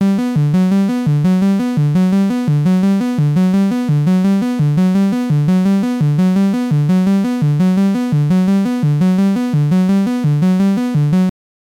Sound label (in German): Korg MS20 Sequenz HP Filter Klopfen gleich